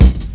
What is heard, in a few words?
clicky kick, B key, bass drum sound tuned to the B note. Loudest frequency: 710Hz